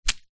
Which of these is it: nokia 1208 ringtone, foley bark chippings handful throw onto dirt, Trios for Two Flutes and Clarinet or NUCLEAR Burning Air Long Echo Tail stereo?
foley bark chippings handful throw onto dirt